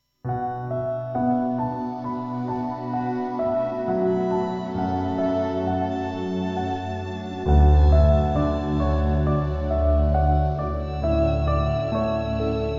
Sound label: Soft Piano Music 32000hz; AC3